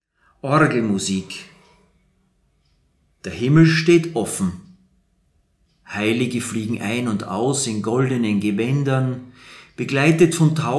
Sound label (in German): Gedichte Orgelmusik